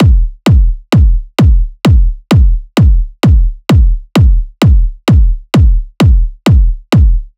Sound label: Kick Loop 130, Techno